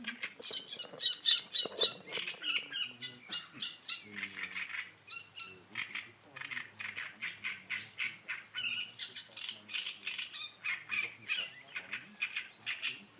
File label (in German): Drosselrohrsänger Garten